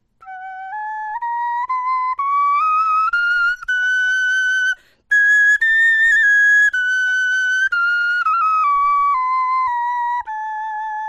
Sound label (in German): tonleiter, knochenfloeten